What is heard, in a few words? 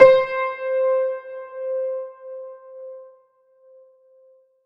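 piano C5, Keys; Old G; Polyend; Simulacrum Sounds; 1 Shots; Acoustic and Electric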